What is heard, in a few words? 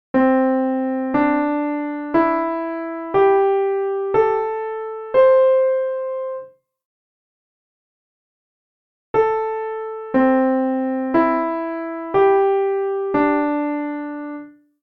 Hard Melody